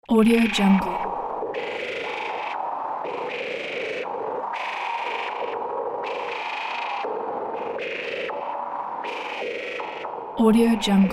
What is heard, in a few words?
alien experiment interface